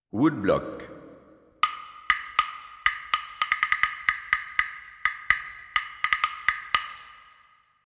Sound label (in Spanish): Caja china